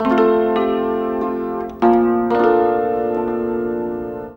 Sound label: ZIMB ARPEGGI; Best Service; World Colours